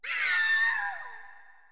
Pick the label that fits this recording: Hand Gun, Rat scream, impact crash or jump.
Rat scream